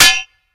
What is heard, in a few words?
Metal Pipe Hit; Angry Birds